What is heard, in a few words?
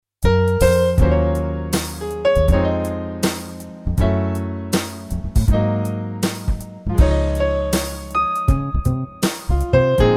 groove